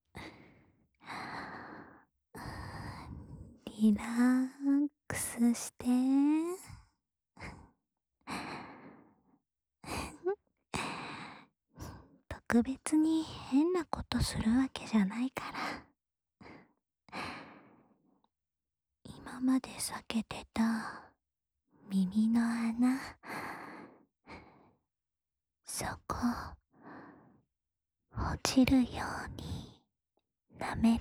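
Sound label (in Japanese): 気持ちいい耳舐め（左耳）, ASMR; 気持ちいい耳舐めのお手本（左耳）; 静香による気持ちいい耳舐めのお手本（左耳）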